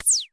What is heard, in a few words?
Dual-Percussion-Synthesiser; Klone